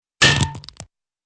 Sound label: skull cracking klunk